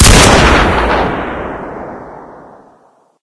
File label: wa2000 shoot, weapons; svencoop; st mission